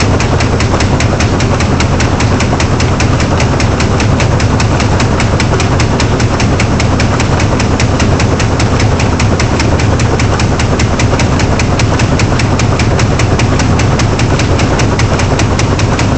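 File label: ambience industrial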